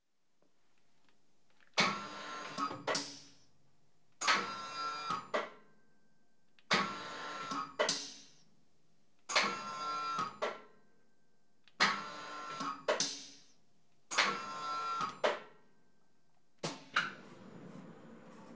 multi purpose motorised printing press switching on and off; praga